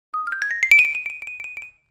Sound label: Xylophone Accent Shr